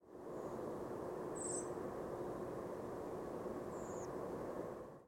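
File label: blackbird and redwing nocturnal flight calls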